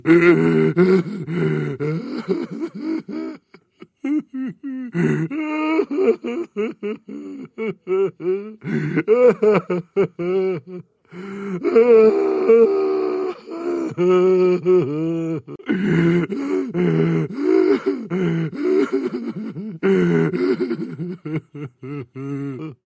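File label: crazy person; sumasshed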